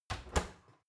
oven close soft

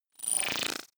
data transfer splutter

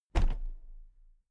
toolbox place upright regular

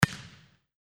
volleyball serve

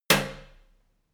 letterbox flap close

